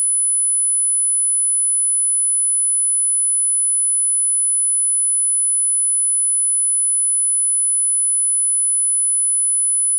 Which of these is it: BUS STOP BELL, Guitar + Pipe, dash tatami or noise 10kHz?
noise 10kHz